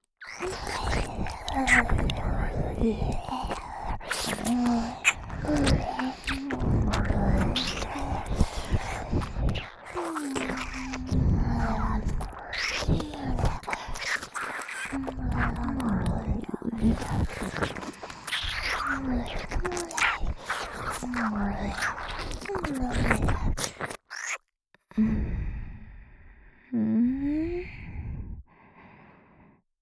密着高速＆逆再生耳舐め, ASMR; 長身美女怪異姉妹; 全身リップ; 八尺様

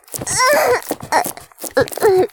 "絶頂"; おまけ(絶頂+潮吹き+中出し ランダム再生); 騎乗位マニアック

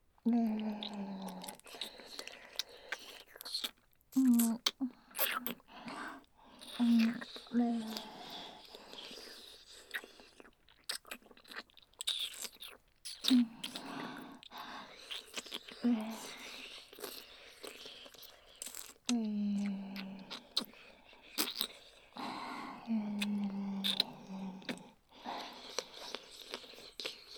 両乳首舐め (ループ対応)

